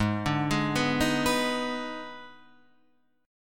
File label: D sharp Major 7th Suspended 4th Sharp 5th, G sharp